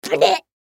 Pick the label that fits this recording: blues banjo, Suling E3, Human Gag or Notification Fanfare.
Human Gag